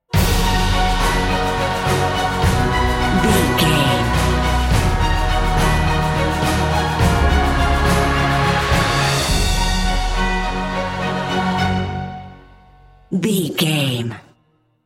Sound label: cinematic corporate